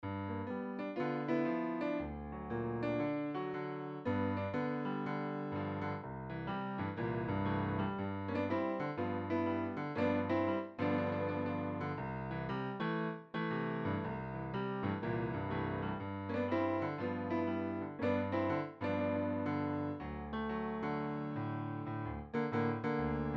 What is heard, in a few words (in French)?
saint louis blues sans melodie